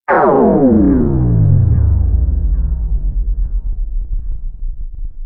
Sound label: Pyowww; pitchshifter PSI